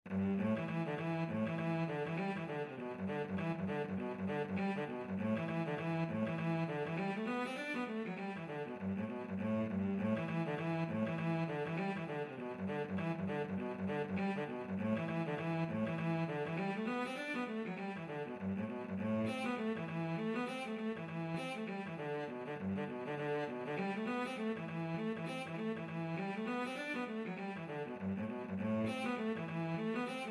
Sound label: reel, cello